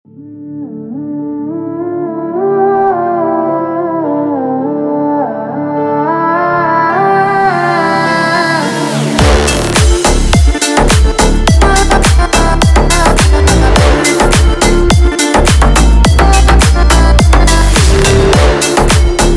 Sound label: night drive